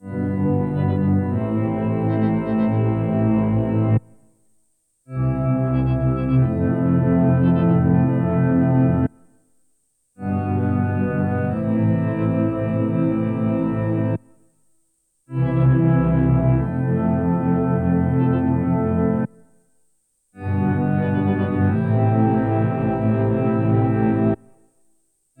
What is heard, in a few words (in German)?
Freeze fast Fade Drive Pitch Error untere Oktave; Chase Bliss Onward